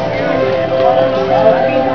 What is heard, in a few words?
gamelan